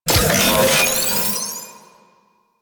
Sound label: Password Glyph Pickup; Warframe; Veilbreaker; Quests; Kahl